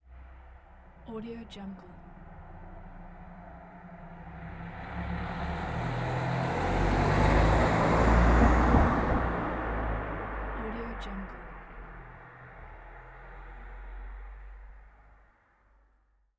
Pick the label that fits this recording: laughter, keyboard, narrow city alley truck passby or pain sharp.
narrow city alley truck passby